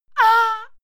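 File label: female fight pain, screams; schreie; menschen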